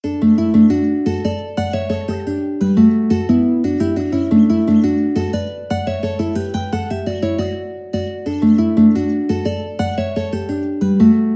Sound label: mandolin duet